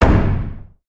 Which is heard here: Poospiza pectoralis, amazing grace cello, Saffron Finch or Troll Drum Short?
Troll Drum Short